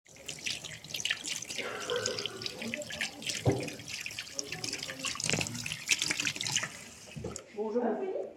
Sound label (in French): lavage de main